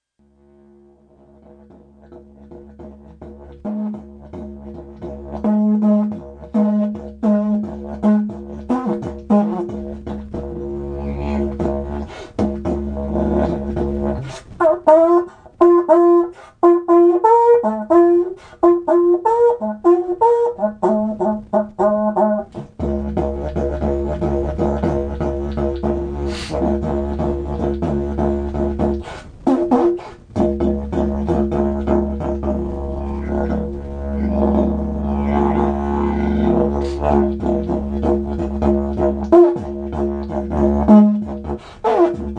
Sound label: didg horn; Two instruments wrapped up in one.; Didgeridoo